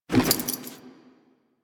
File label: Foley Idle Short; Warframe; Duviri; Teshin